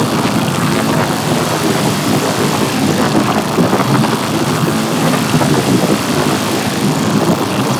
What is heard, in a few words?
Octopede Lash Limbs Beam Loop; Warframe; ManInTheWall